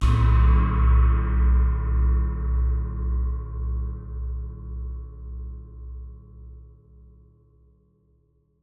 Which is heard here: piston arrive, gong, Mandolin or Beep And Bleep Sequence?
gong